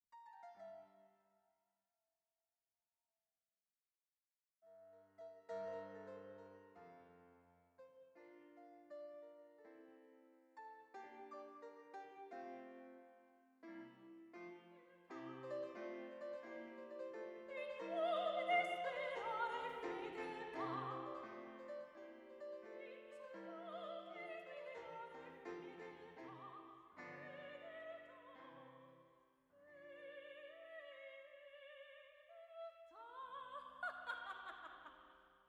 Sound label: Mozart Ambisonics RS